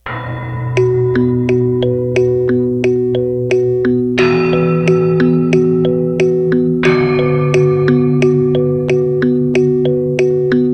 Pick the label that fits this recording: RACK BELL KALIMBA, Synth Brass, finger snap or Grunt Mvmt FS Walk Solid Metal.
RACK BELL KALIMBA